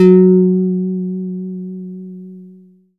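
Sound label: synth Harplike; Best Service Gigapack 1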